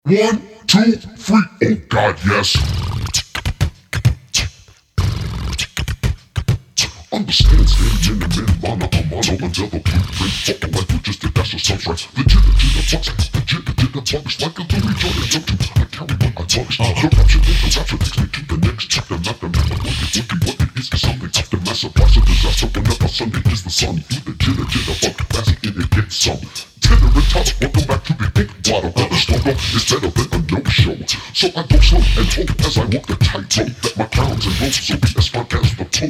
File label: Beatbox Detuned Fuzz Sessions